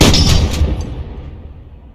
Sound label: 40mm shoot; Tacz1.1.5+; RSWS 1903